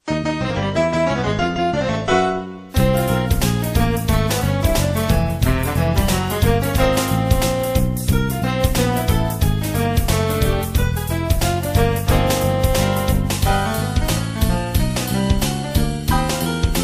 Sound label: Hip Jazz